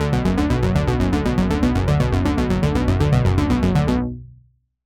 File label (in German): arpeggiator laufrichtung down